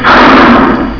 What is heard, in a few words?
fall; grimlock